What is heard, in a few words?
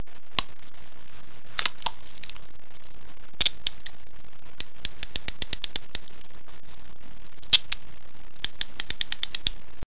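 handcuff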